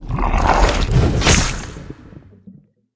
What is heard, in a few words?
swamp giant attack, monster